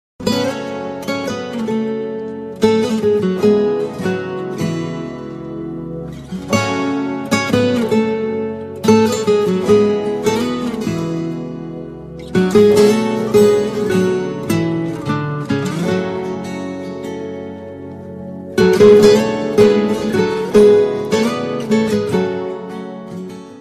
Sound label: Romantic Guitar